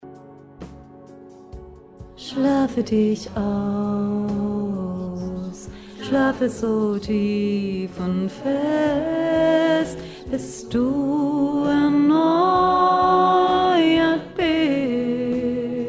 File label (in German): Sternenstaub Mittelstimme